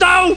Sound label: fall; quake2; homer1 4